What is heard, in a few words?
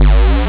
start; javanoid